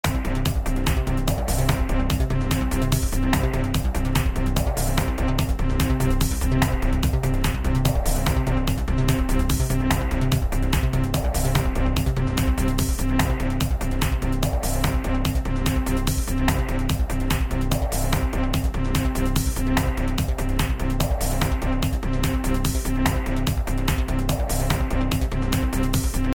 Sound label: Goodbye Punter Loop; Millionaire Soundtrack; US Clock (2008)